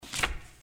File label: FOLEY PAPER Card Menu In